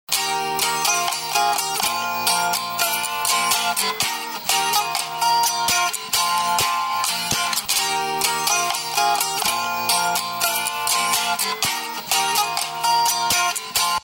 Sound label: 5 String Hook